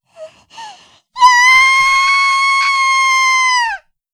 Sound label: kyaaa; 女; 悲鳴７ きゃーーーー; 少女的悲鳴２